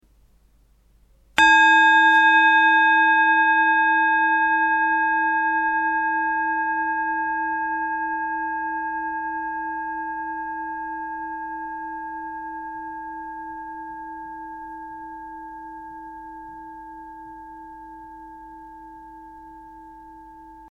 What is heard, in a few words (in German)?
Klangschale